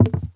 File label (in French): caisse